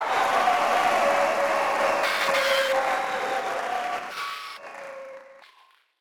Crowd Cheering; Pitch Black Audio Pong

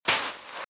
Footsteps - Sand - Dry - Walk - Right; RadwinIsland

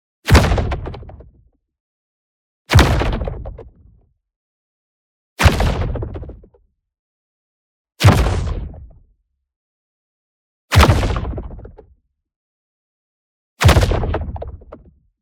EXPLOSION SMALL Missile Attack Sweetener; boom library; Matter

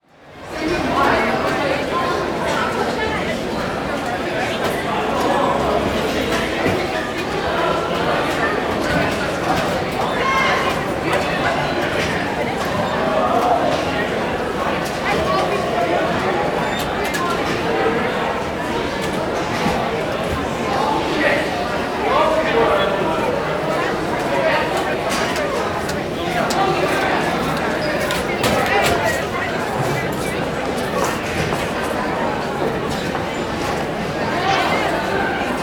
School Busy High School Hallway; Sound Ideas; Walla Series

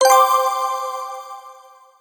generic success; Space Wave Race

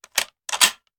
Rifle Foley; Sound Ideas; Guns

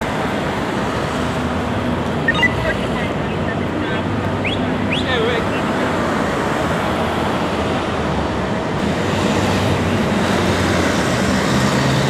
city atmo mixed LA; best service Blue Box

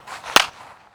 Baseball Bat Crack

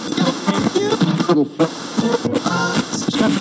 FM Radio Tune; Communications; 1001 Sound Effects

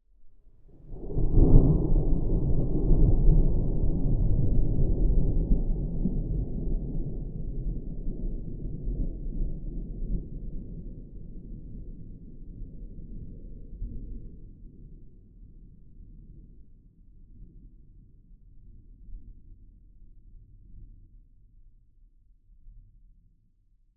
Thunder moderately distant; boom library; Thunder And Rain

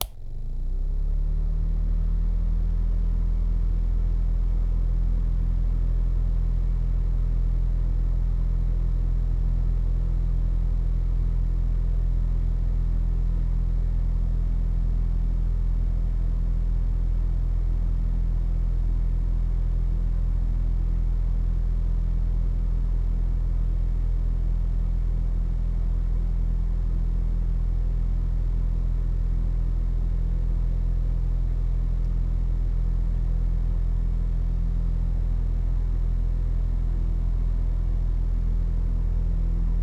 minifan; devices accessories utilities; NS Studios - ultra sound collection

